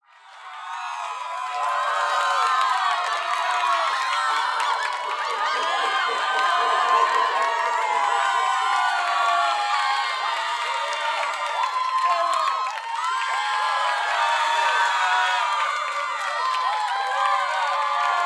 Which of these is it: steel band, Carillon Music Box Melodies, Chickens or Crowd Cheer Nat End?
Crowd Cheer Nat End